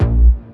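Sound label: B flat Clean Bass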